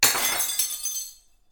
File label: cup break